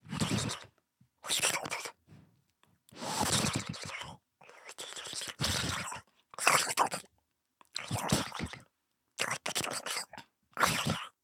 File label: rest idle; Fluke Mother